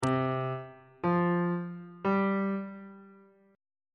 d double flat suspended 4th triad chord intervals on bass clef